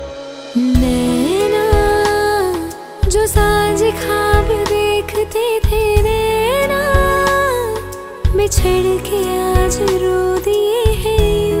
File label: Best Hindi Sad Female Song Ringtone